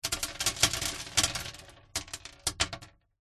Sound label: foley stones small gravel drop handful on empty wooden box lid recorded inside